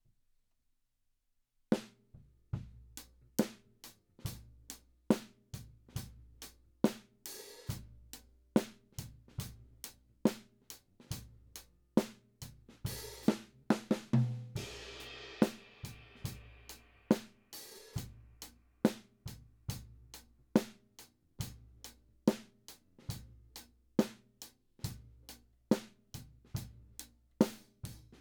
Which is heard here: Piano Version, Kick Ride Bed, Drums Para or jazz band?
Drums Para